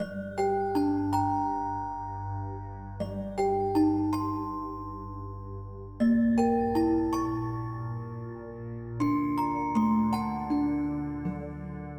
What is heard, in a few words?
Horror Theme Loop